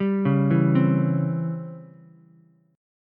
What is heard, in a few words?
C sharp Minor Major 7th Flat 5th C sharp down Ukulele; C#mM7b5; arpeggiated; JGuitar